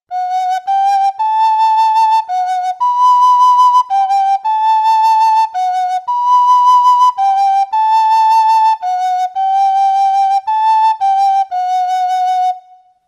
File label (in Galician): vibra sopro, Pito Galego; afinacion